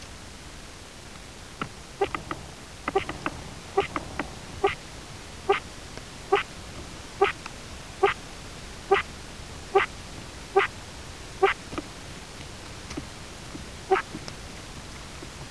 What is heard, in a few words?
Glossonotus, treehoppers; Cocroft